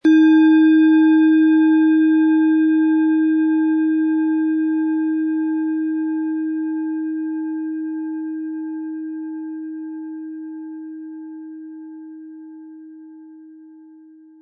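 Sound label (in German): Planetenschale Eros inkl. Klöppel; Eros, Ø 12,5 cm, 260-320 Gramm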